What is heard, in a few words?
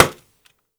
lrg wood hit sharpobject; 3 Leaf Productions - Audio Pack; rock-wood sfx(mini pack)